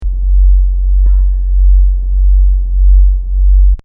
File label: Earth tides, 21 octaves; Schwartzwald; Geologisk Nyt